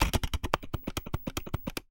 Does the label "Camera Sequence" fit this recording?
Yes